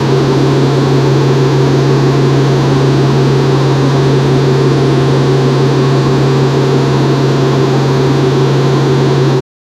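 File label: start of a powerful turbine, machinery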